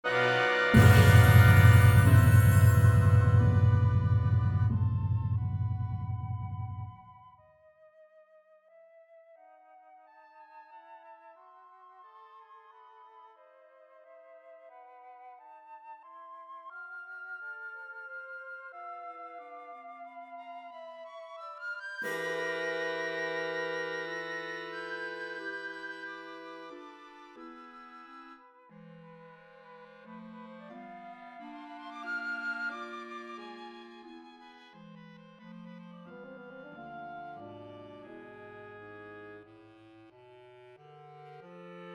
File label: MIDI realization